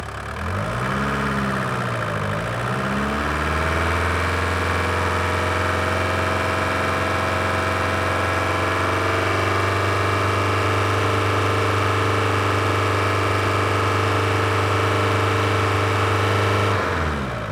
Volkswagen Golf II 1.6 Diesel Engine Driving; Mono; SoundHolder

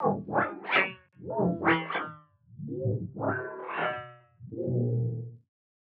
Movement; 99Sounds; InterSpace

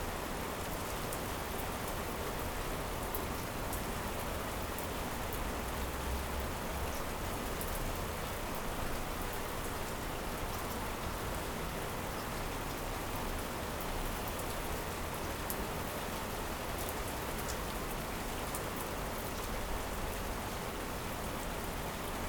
rain window; 99Sounds; Rain And Thunder

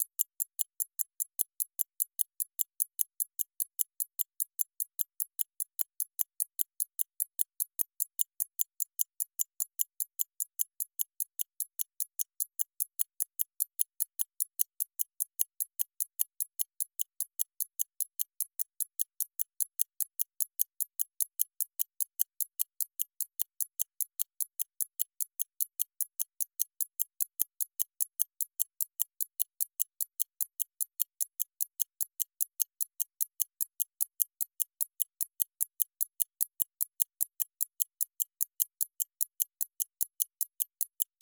Antique Pocket Watch Ticking; CLOCKTick; Mono; Justsoundeffects; Clocks and Mechanics

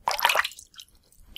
sink water; Foley; Kenney's Sound Pack

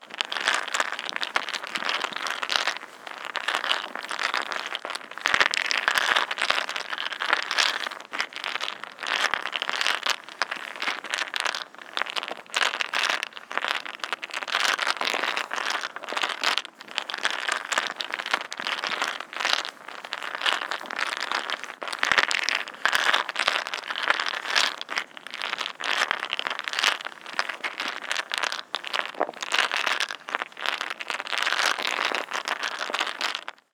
PARTICLES RUMBLE Almonds; SoundFxwizard; PARTICLES An Organic & Granular Journey

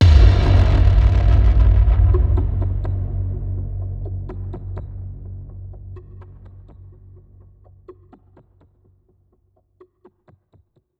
Deep Scifi, Cinematic Impacts; 99Sounds; Rumore

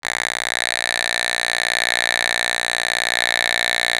Bass drone A#, Circuitbend; Drones - Bass; 99Sounds; Mangling Audio

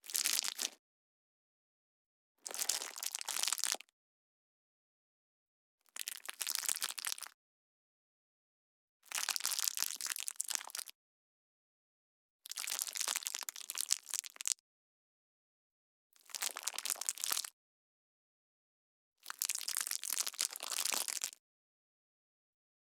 SQUEAKS AND CREAKS Plastic Wrapper Long; Squeaks and Creaks v2

